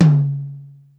Mid Tom, Yamaha DD-35

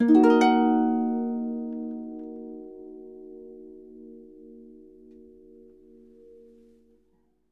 D#, Percussion & Harp; Miroslav Vitous

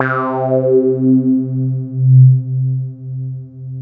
FUNK; CMP - Analogon

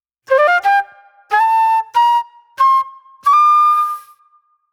J-Funk Flute, Call And Response; Sonic Foundry (Sony Creative Software); Manhattan Flutes

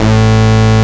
A-BASS, AN BASS; AKAI S-Series CD-ROM Sound Library VOL-7

